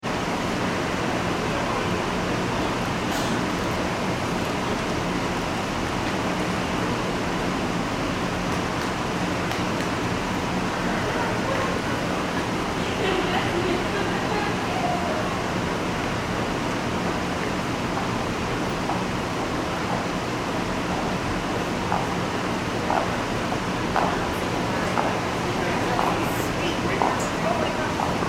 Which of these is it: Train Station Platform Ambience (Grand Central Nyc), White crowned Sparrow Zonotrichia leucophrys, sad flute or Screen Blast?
Train Station Platform Ambience (Grand Central Nyc)